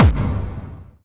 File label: Bombo Hardcore, kicks